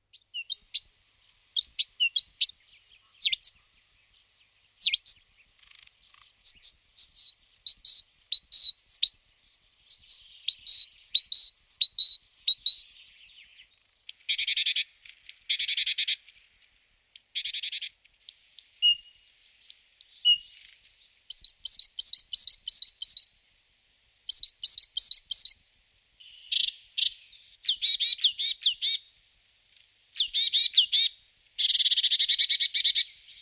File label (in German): kohlmeise